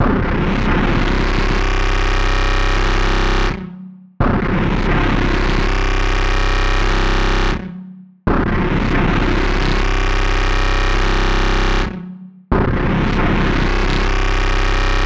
Hard Worker Loop; martyn stonehouse

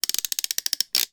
toy ratchet wind

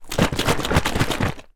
food drink milk carton shake

